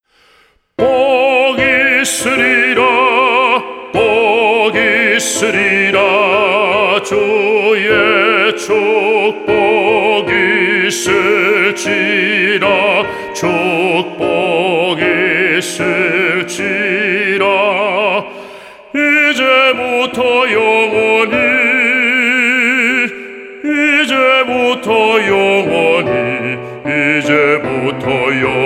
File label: BASS